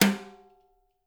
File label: TIMBAL, BR TIMBALES; Spectrasonics; Liquid Grooves 1 & 2